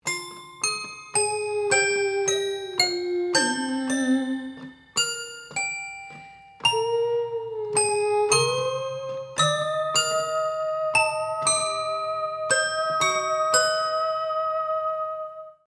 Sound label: Music Box